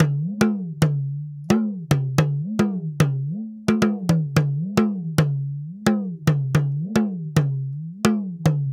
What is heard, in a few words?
Talking Drum, African